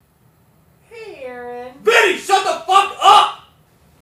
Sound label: annoying